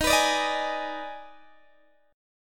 D sharp Major 7th Suspended 4th Sharp 5th, Guitar, Open D